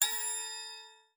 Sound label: Windows Ding